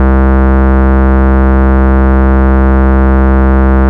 Ultimate Bass Root C; Vengeance Samples

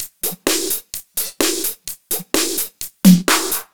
House loops 128BPM; Vengeance Samples

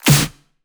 Pre-Shifted Claps; Vengeance Samples

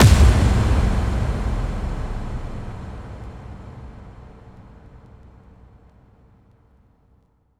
Hits & Explode

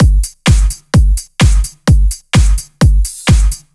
Beat 128BPM; VFX2 Demosong

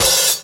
OP Hihat; Open Hihats

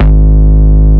Bass, Root G#; Vengeance Samples

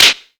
Snare; Vengeance Samples

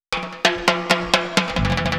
Fill 128 BPM; Vengeance Samples; VEH1 Loops

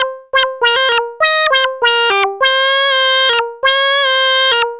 ARP Odyssey, Arp A Line, synth samples

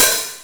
Cymbals OH, Open HH; Vengeance Samples

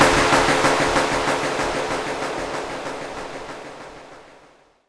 FX Downlifter

